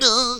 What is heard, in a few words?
pickup rock; ice age scrats nutty adventure